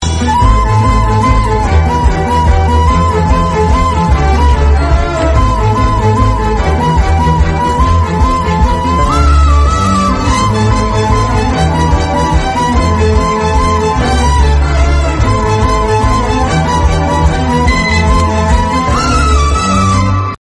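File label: flute background music; moonu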